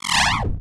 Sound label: lude die; free 2 play